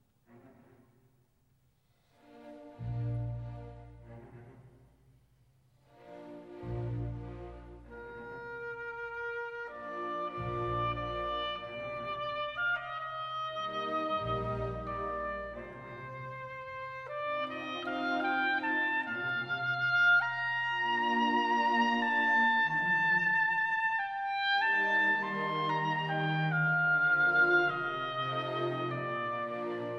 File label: Oboe Concerto, Andante; Strauss, Richard